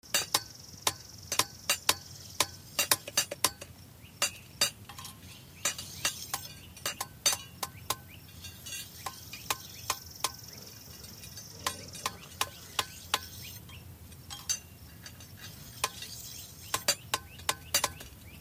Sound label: rustic blacksmith